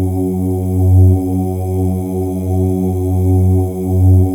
M+F OOS; Spectrasonics; Symphony of Voices

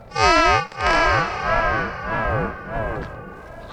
SPACEY R, Funk Guitar; Zero G - Funk Guitar

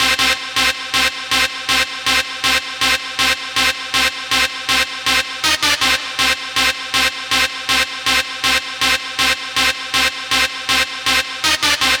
Dance Synths, Hardcore Loops, Synth Loops

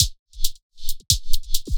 Dance Grooves; Best Service; Dance Mega Add On Grooves

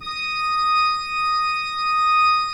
pipe organ, 3-D Audio

